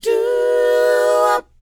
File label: DOWOP C#, Vocal R&B DOO WOP; ILIO; Vocal Planet